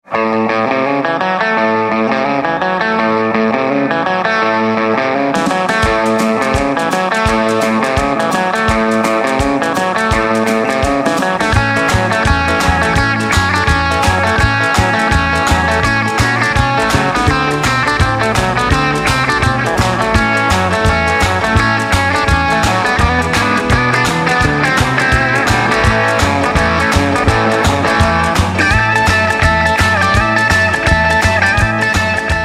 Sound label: guitar